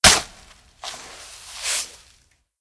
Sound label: Shovel Sand; blue archive